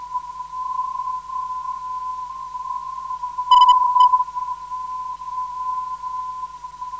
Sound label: Y 404kHz, ndb